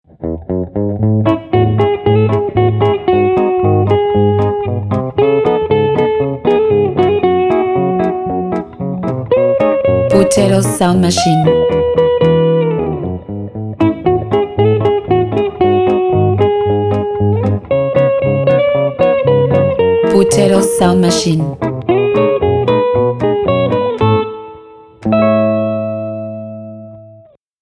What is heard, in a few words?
guitar